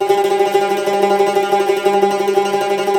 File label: CUMBUS Tremolo